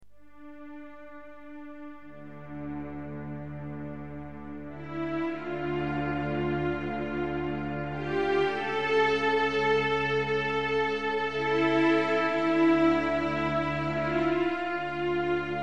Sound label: strings